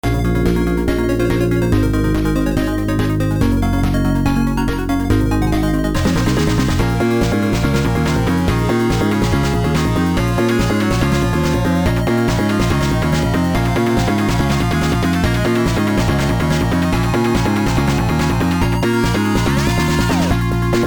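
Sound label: FM synth music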